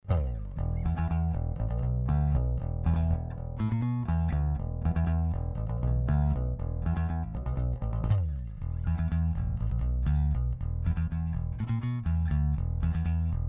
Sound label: Ampeg 4001 Flat Ultra Lo Ultra Hi